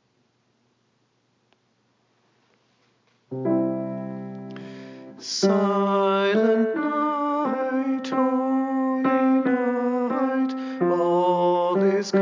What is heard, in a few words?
Loves Bright New Face Tenor; Rowbotham